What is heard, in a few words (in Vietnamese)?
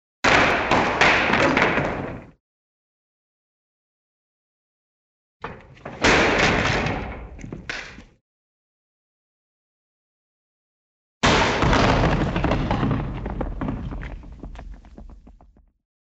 tieng do vat roi tu cau thang xuong